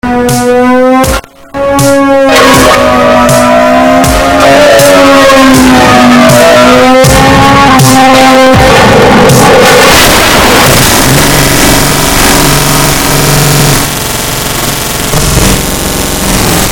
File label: noise not beats; Beats Off; lolicore